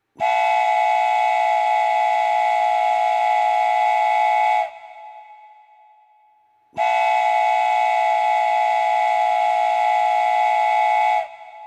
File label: train whistle